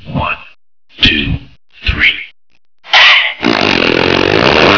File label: Elevator